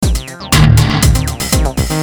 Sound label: depressing rock music